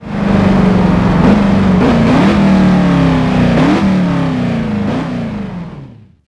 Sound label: throttle off, vehicles; gmod; tdmcars; ml63